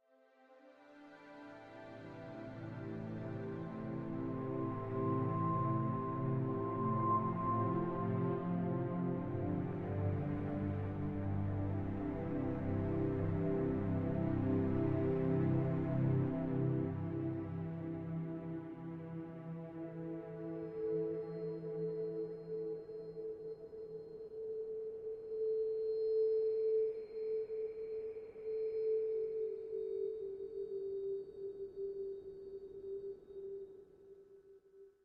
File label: Home Positive Stinger; State of Decay